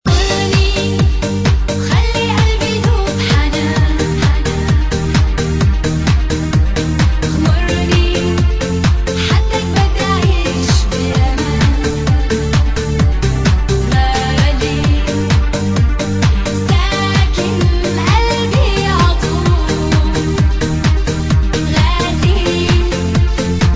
arabian techno

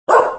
Dog

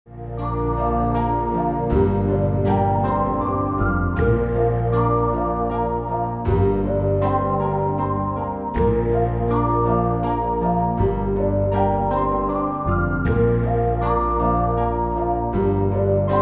Relax Music

